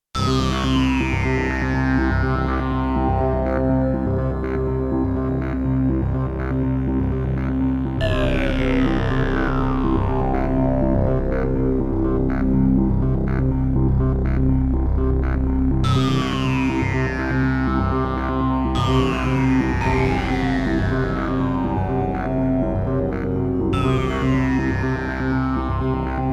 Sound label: Sweep Loop on C